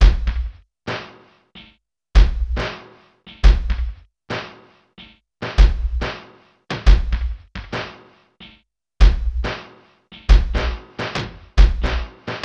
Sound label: HIPHOP, Funky; INIS; Funky Xtreams